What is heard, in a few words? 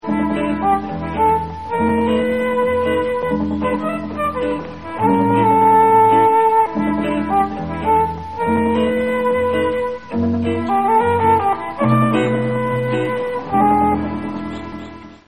slink music